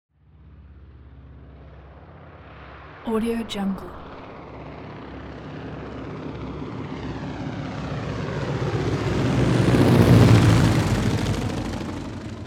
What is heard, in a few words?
transportation prop plane ju52 fly by overhead from front